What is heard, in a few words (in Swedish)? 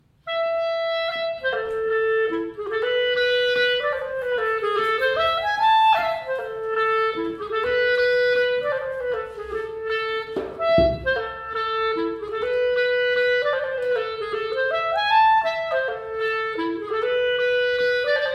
Mazurka; efter Nordic Dance